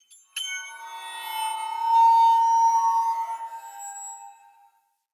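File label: Cave Crystal Magic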